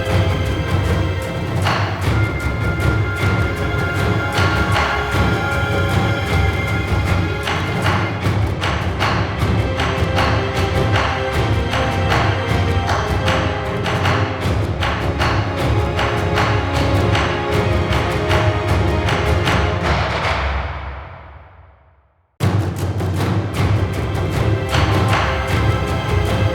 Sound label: PVP Game End You Win